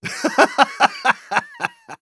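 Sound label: laugh happy, German; Engineer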